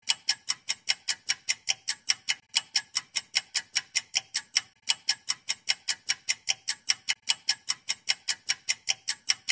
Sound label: tic toc